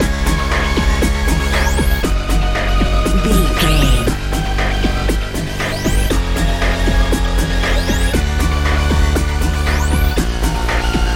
good sunny dance

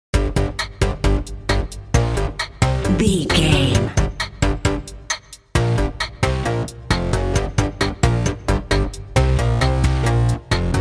character selection screen video game

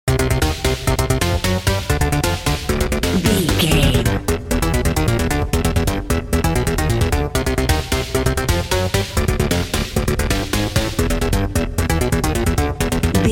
intense video game level loop

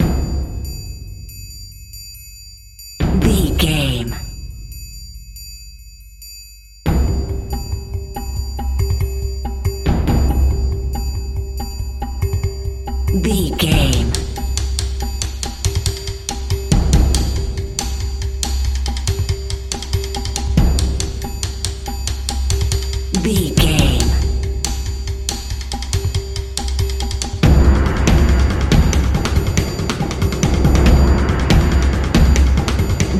epic cinematic percussion loop

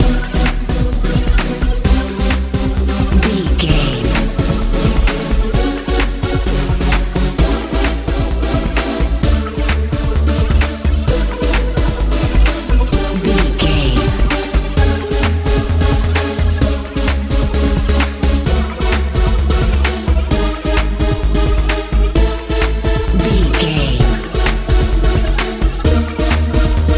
fun warm echo head love dance pop

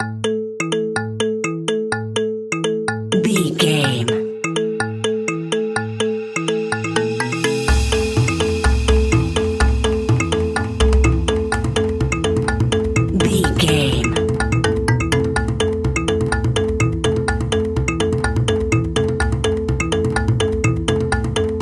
arcade game

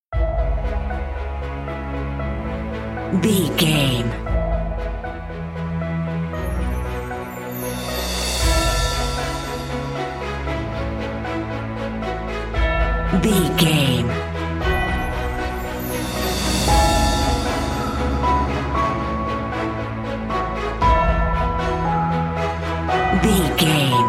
cinematic corporate main

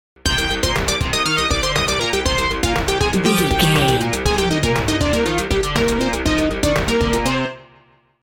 classic adventure video game stinger

